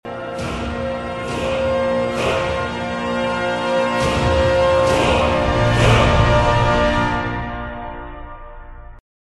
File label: skyrim level up